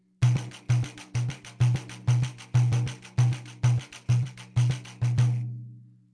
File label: Frigideira